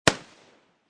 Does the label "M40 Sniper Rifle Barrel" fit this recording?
Yes